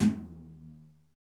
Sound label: TOM TOM, Real